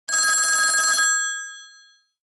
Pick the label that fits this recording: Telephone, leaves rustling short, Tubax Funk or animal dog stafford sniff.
Telephone